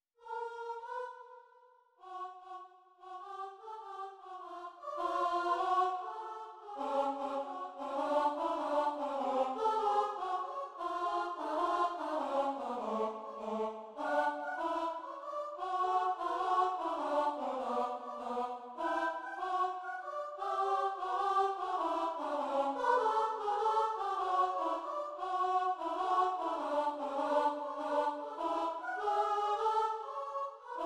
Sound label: Fugue alto; Fugue Trondheim no1-alto